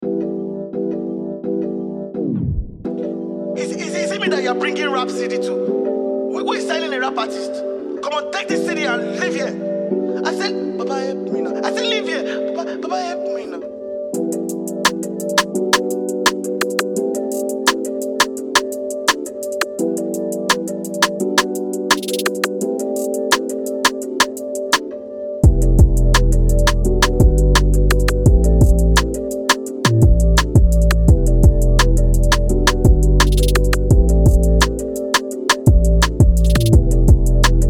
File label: Industrict beat